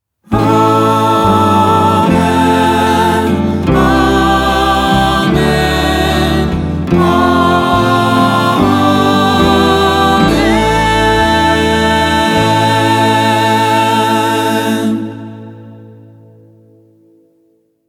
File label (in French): amen, chants; celebration eucharistique suggestions de chants